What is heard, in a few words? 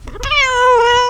Cat Meow